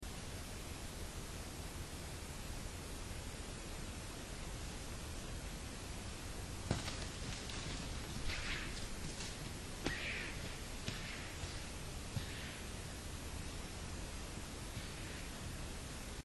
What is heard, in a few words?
beatdown